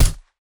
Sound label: kriss silencer; STALKER